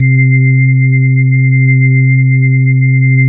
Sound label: HX 1 ORGAN C; KEYS; Alpine Volksmusik